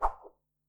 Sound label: Sword Swing Medium